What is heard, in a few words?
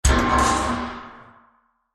Massive Metal Impact With Scratches; Bursts; Impacts; Crafted Signals